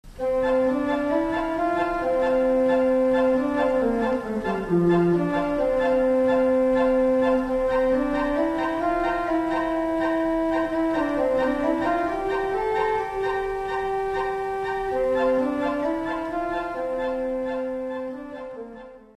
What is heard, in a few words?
Organ Swell Spitz Principle 4